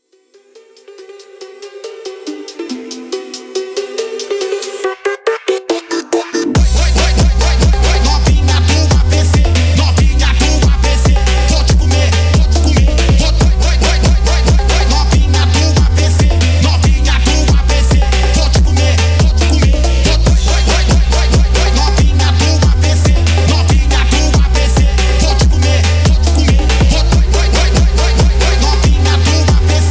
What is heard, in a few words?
phonk phonk 2025 mix